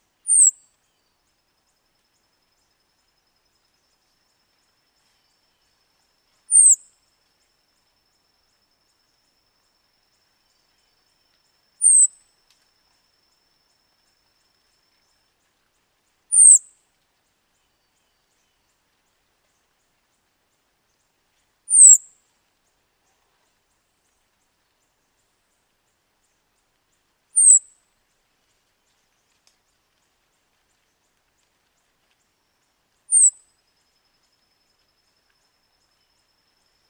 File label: Moss beaked Tanager